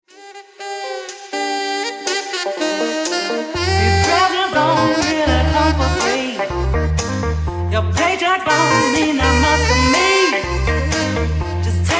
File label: sax